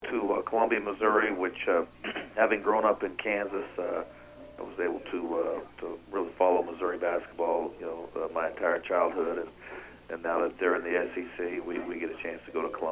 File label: Atmosphere; Fox On Mizzou